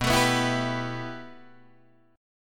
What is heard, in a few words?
B 7th Suspended 4th Sharp 5th, Guitar, DADGAD